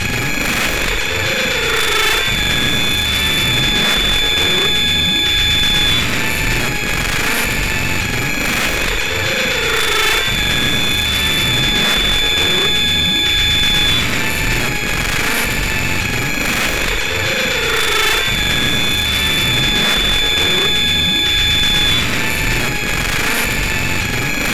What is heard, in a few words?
ring; telephonebrain; confusion